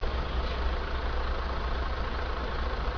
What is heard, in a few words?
truck noise